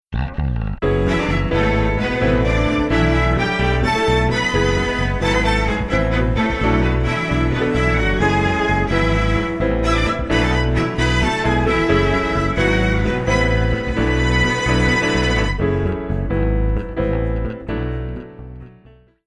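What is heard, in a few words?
Strings unison Bass On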